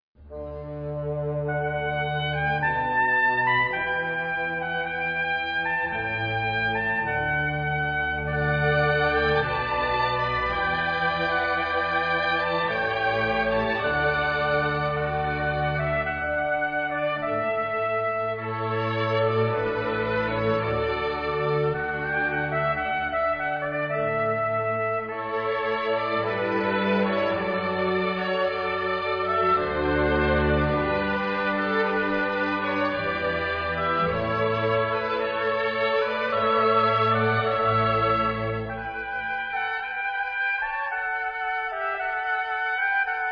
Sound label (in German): Orgel; Fasch